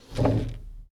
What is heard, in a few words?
Foosball Blocker Spin